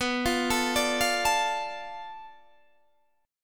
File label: B Minor 7th Flat 5th B Guitar DADGAD